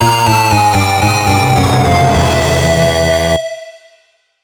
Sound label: Music Game Over; Dungeon League; Music Gauntlet Game Over